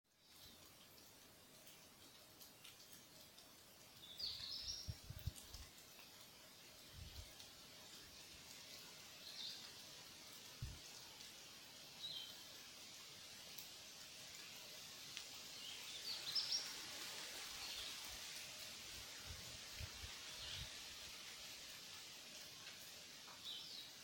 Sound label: Saturday Morning Rain; On Toms Knob